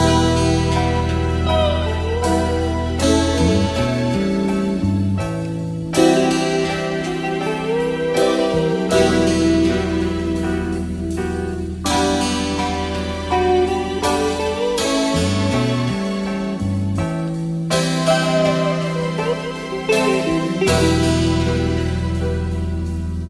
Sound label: keys depressing funk, sad loops